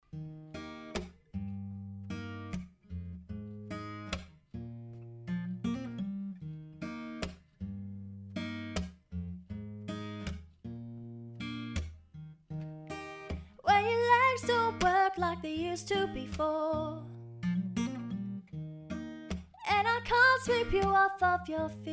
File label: Acoustic Duo